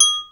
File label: HI TRI; Hollywood Edge; The Big Bad Beatmachine; XR-10